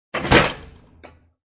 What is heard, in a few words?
Dishwasher being closed.; Old Dishwasher Close; Kitchen (Sound Effects:Household)